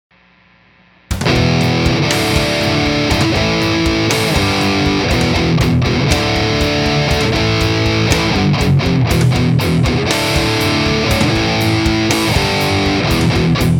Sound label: Riffs